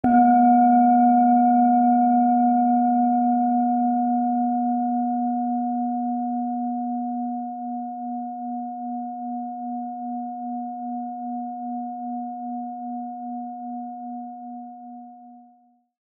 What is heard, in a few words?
Tibetan Singing Bowl 480 Hz Deep Resonance; Crown Chakra